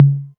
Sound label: 808 Tom Mid, Drum Machines